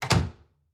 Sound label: Door Close; MiSide